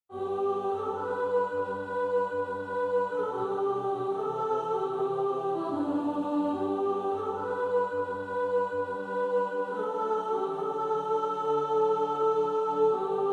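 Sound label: When the roll is called up yonder soprano, choir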